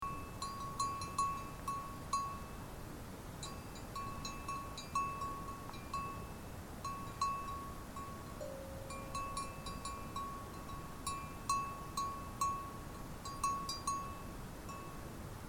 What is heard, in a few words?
dozing yaks, nepal